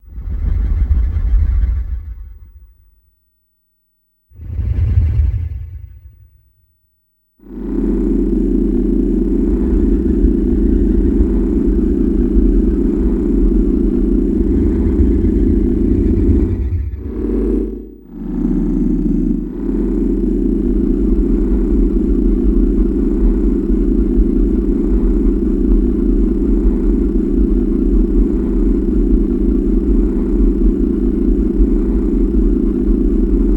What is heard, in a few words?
Starship Amb Multip